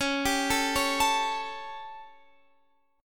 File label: G Suspended 2nd Flat 5th C sharp, chord